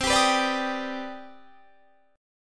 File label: C Minor Major 9th C